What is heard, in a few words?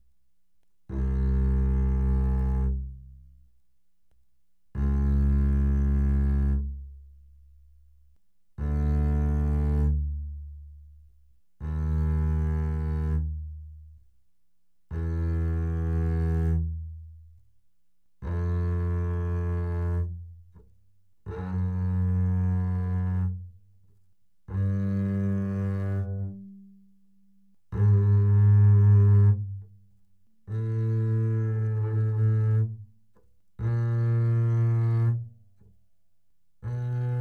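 Bass arco fortissimo sul E C2 B2, doublebass; Strings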